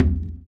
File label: surdo; ILIO; Ethno Techno